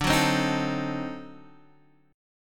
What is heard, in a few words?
D 7th Flat 9th D down Guitar Half Step Down